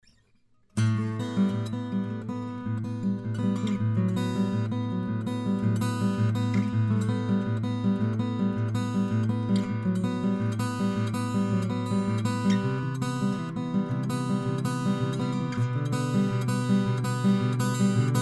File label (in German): Clarett off Air Akustik Gitarre